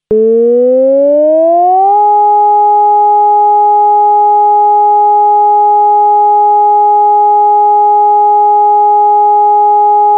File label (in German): Entwarnung